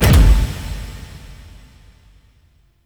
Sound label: Vaults Cylinder Start; Entrati